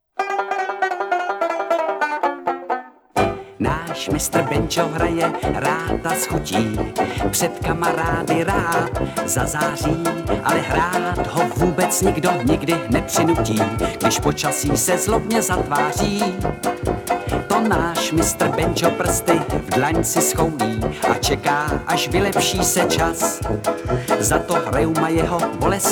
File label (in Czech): Mistr Banjo